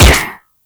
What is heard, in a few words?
UMP Shot, Weapons; Prisma